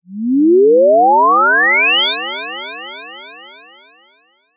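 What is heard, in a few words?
cartoon sci fi rise laser whistle delayed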